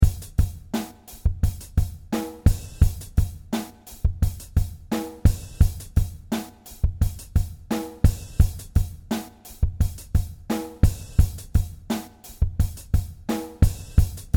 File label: clean with reverb